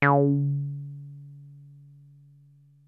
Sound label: 303 C3, TB 303; Hollywood Edge; Giorgio Moroder Rare Synthesizer Collection